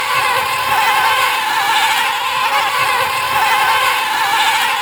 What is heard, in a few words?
flies loop